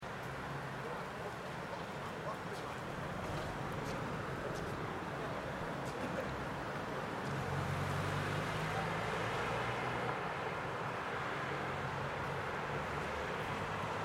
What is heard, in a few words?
Neukolln Crossing